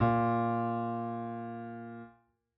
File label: piano a#; Golden Crayon Sounds